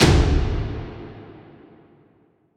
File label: Loop End; Shuriken and Aliens